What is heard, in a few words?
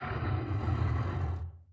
sleep breathe